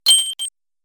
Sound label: coin